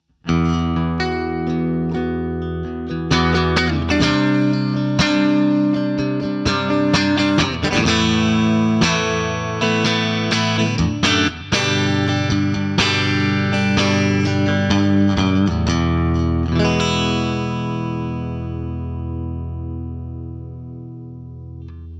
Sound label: Fender Clean Both PUs